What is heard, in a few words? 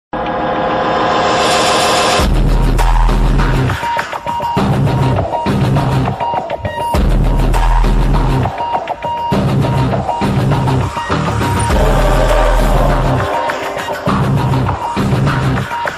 bgm